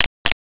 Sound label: steps